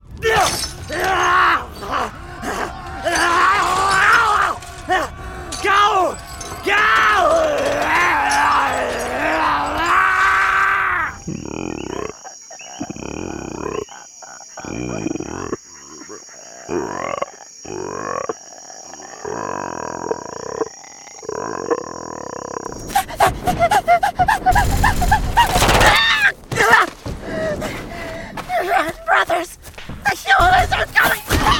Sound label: Creature Monster (MIXED); GAMING